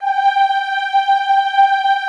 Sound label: "G4", choir; Best Service; Extended Classical Choir